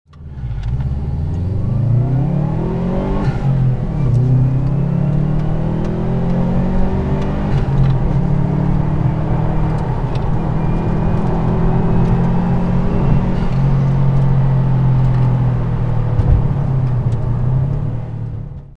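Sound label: magnaflow incar, exhaust systems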